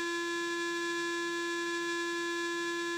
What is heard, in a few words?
G4 normal, Clarinet